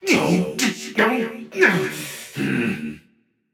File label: grumble, voicepack; smite; jorm